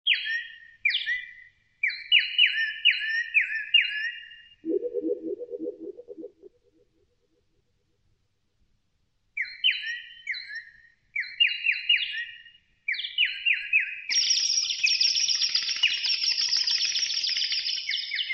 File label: Bird Sms